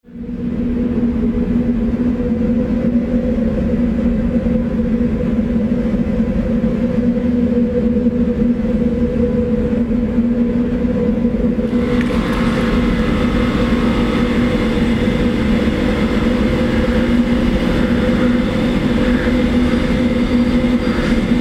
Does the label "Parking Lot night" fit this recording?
Yes